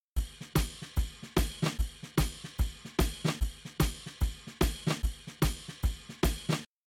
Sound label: Drums, shuffle drums; WDR2